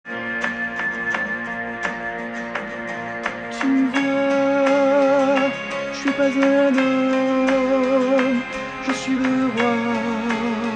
voix basse, Harmo basse; Groupe de gauche

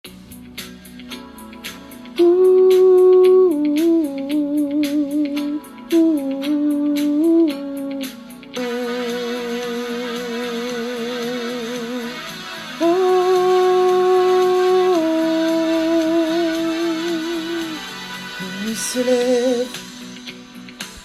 début, Vocalises début

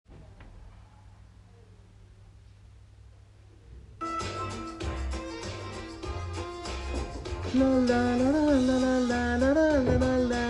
fin, Voix de Fin

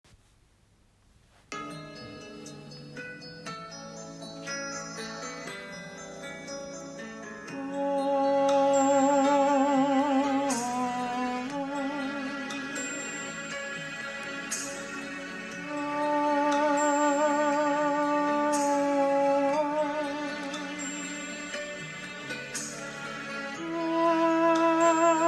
vocalises

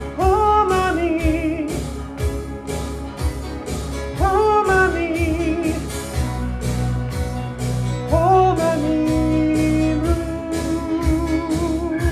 choeur couplet groupe de gauche

